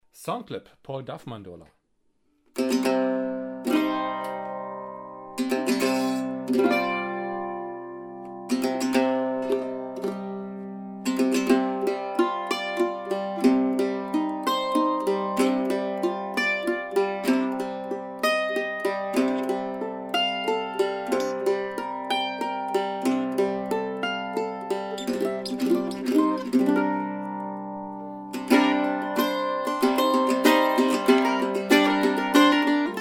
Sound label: Mandola, mandolins; Duff, P. (Australia) H5 Mandola; Fantastic used Mandola!; Absolutely beautiful Cremona Sunburst and flamed Maple!